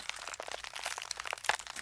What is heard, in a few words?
Creak Loop